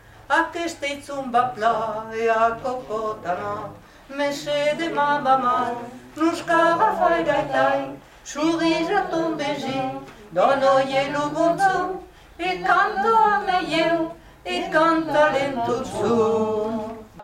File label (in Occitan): Cançon; Va plan de Pauleta; Oles repic